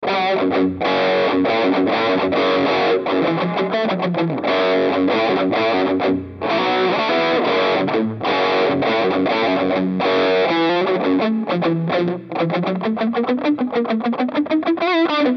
Sonic Drive; Mooer GE 150

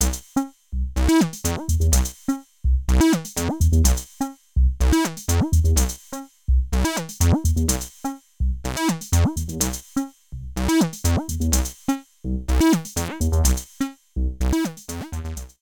System 500 Stereo

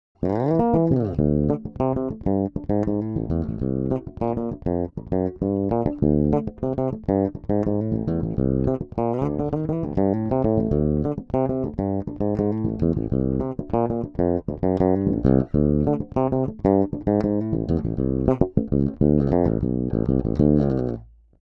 PRS Grainger 4 Strg. Fingerstyle

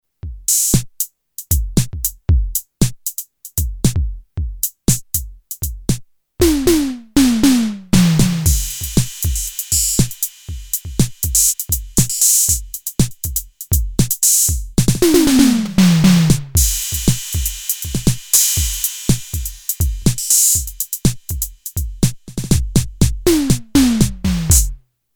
Behringer XD8USB 808 Kit

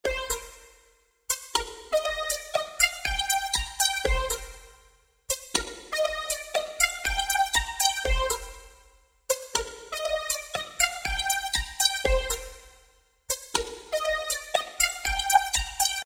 Vocoder Bass

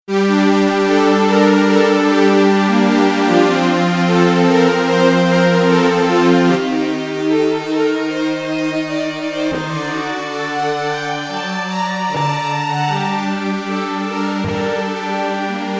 iSEM Strings Delay FX

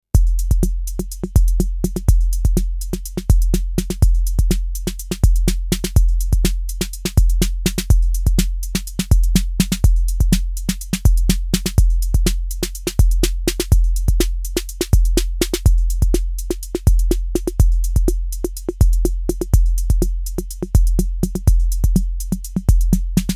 Snare

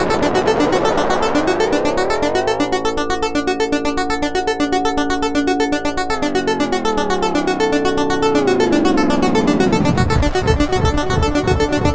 Buzzy fast delay comb filter; Freds Lab

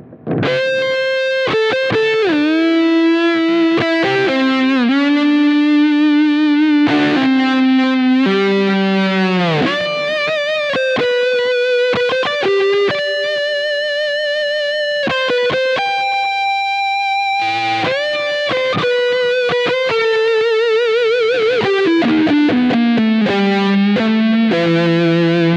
Rams Head Driven Amp; J Mascis; Sustain 2 Uhr

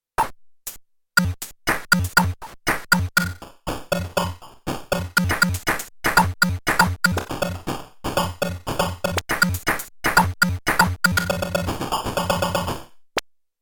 Chip; KOPRO+

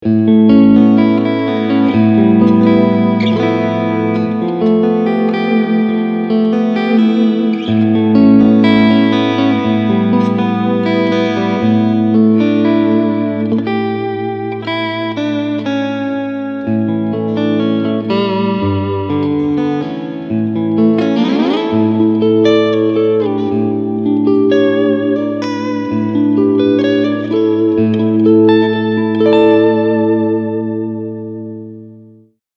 Cleansound

